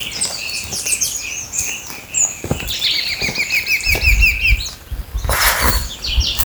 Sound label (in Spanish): Anambe verdoso